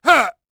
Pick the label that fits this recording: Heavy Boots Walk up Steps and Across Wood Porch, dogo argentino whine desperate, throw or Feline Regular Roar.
throw